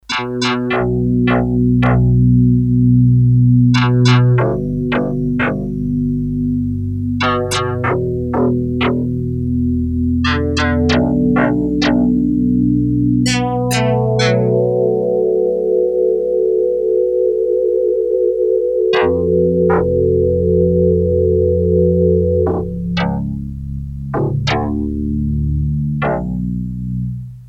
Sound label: Clang Keys, Korg DS-8; Factory Patches